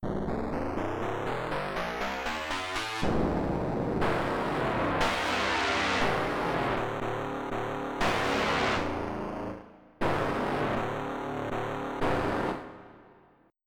Dry Guitar Pad

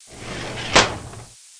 File Cab; Florida State Football - Ultimate Multimedia Experience

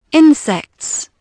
INSECTS; Dorling Kindersley My First Incredible Amazing Dictionary

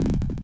Standard Falling; Pushover

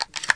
shotg load; weapons shotgun; KINGPIN

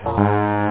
SAX; da capo vol1; instruments; synth

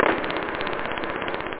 knock; da capo vol1; drums

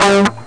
damp note, guitar; OctaMED SoundStudio V1

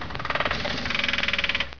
Tyre; Racing Days R DEMO

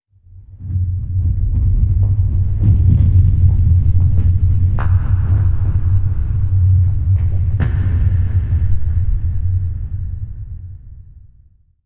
asteriods

